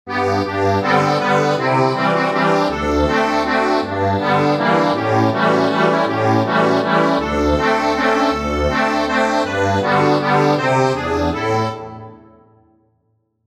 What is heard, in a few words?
accordion